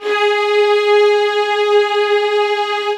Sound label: LD2 SUS G#4, LOUD VIOLINS; Q Up Arts; Denny Jaeger Master Violin Library